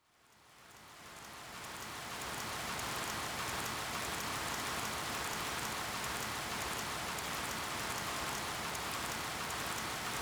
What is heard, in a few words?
Ambience Fishing Rain; AR Games